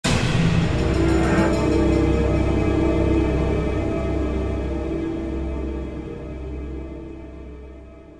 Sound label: SHOCK; F.E.A.R. Perseus Mandate